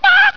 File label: screech